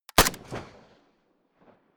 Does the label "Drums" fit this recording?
No